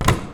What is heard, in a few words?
Furniture